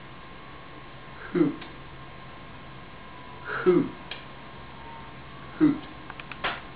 hoot

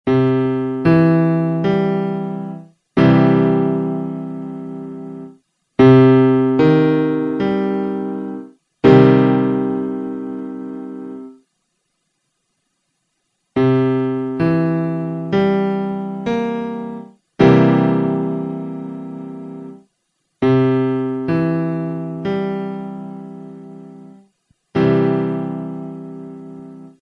Major Minor 7th

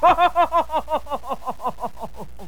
ho ho ho

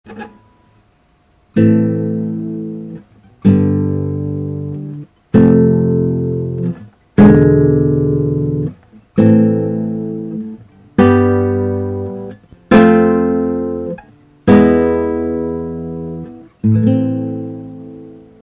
Am G F E7, Framenco

